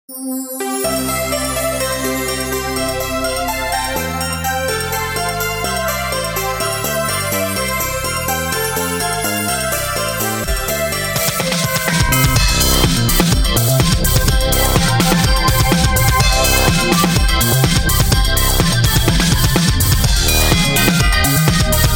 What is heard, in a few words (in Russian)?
v lesu rodilasq yolochka techno pop